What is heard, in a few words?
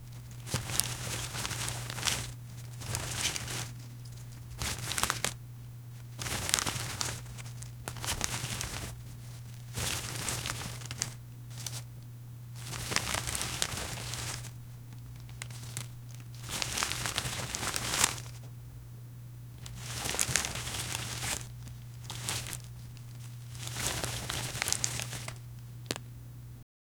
take a notebook out of, bag opening